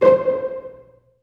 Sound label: STR SEC, STRINGS; Roland - LA Composer vol. 1